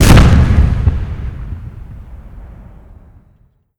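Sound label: weap ac130 105mm distant; Call of Duty Modern Warfare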